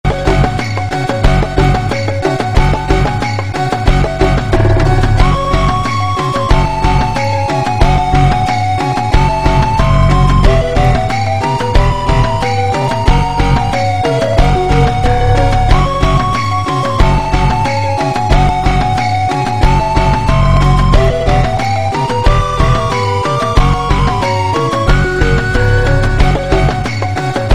RPG風ファンタジー編 砂漠

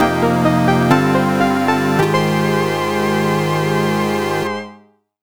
ファンファーレ; Sorcerian; PC-8801

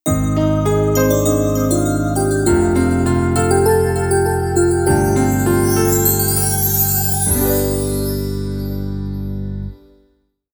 ジングル; anoko ha orekara hanare nai original soundtrack

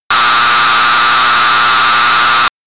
路肩走行音

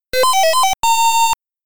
エクステンド・ミュージック; Pac & Pal; Pac-Man; arcade

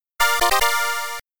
Takarabako Shutsugen Fanfaare; Namco Sound Museum from X68000

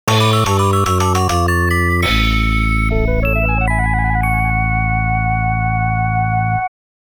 ゲームオーバー; All Target Cluster

